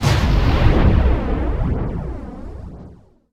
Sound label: rocket explosion; Bananaman - Chase in Space